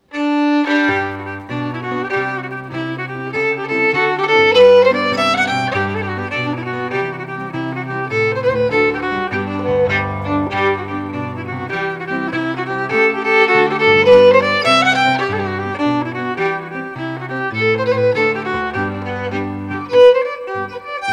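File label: Reel, Scottish Fiddling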